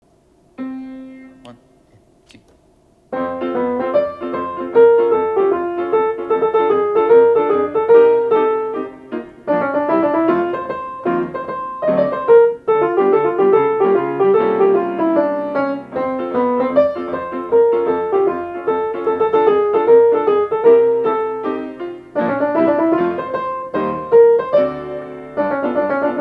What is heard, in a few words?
melody